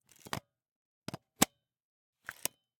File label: Camera, Pentax KS2, DSLR, mechanical, removing lens, replacing lens, 3 moves, XY; Eiravaein Works; Vaeyan IV